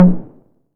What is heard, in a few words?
low mid tr 808 synth tom sample f sharp key